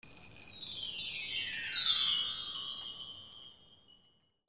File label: BELLS